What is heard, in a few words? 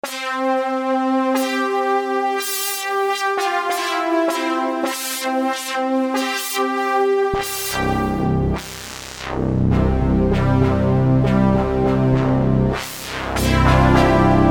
Bendy GX-One, Roland JD-990; Vintage Board